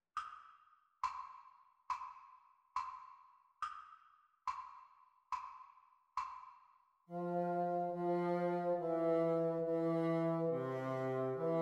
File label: bassoon duets practise speed